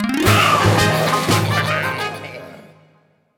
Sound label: mus end of round stinger zombie win